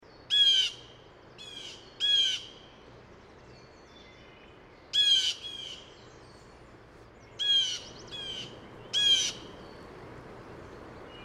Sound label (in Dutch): Blauwe Gaai Cyanocitta cristata